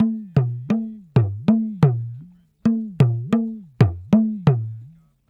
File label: Talking Drum; Ambient Grooves; World Groove